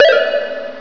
EAT PILL

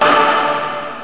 EAT POWER

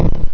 FLARE

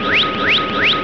WOOSH; VERITECH

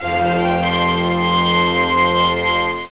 dramatic

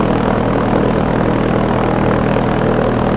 PROP; VERTIGO

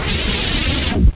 LANDING

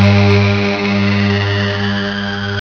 DAAAA

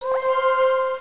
loading

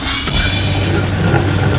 TERROR; EXTREME

